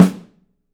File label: PICOLO; 3D Drums